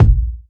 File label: kick drum a sharp key; kick drum samples